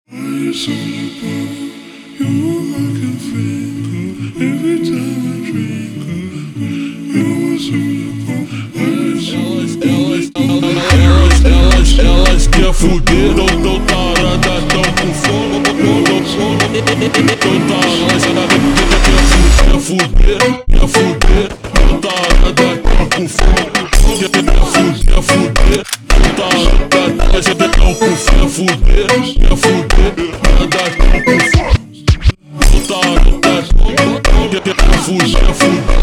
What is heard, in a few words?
Funk super Slowed